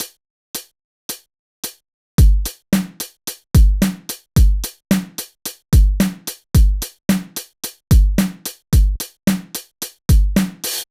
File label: Beat 110bpm, kick variations for guitar practice drum; kick variations for guitar practice drum foundations vol 1